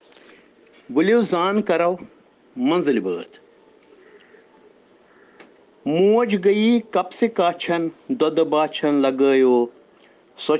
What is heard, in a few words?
Children Rhyms in Kashmiri